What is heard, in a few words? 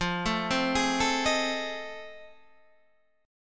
F 7th Sharp 9th, Guitar, Standard; F7#9 arpeggiated; 13,12,10,13,10,11